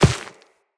Walk Dirt, Footsteps; Operator

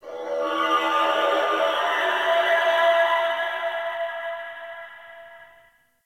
Portal Cry One Off; Warframe; Ambience; Chimera

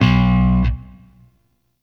Shawzin; Warframe; Sentient Shawzin

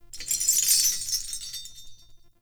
Binaural Chains; Warframe; Shadowgrapher

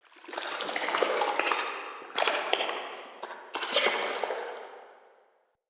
Root Cave Sap Gush; Ambience; Neathuns Root Cave

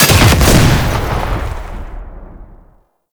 Cannister Explosion; Enemies; Abilities; Warframe; Tau; Dax Archer

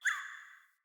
Cardinal Male Bird Impact Chirp; Vocals; Player; Animals; Birds

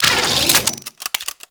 Grenade Launcher Reload, Weapons; Warframe; Corpus

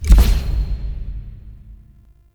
UI Focus School Activate

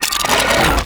Dragon Scales Deactivate

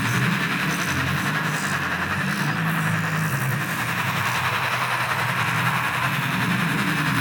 Portal Loop; Gameplay; Warframe; Chimera Prologue

